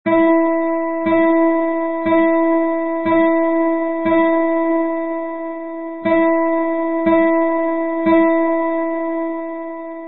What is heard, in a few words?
Sixteenth Note Study